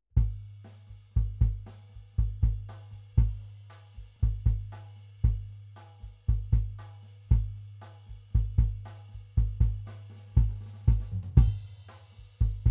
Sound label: Kick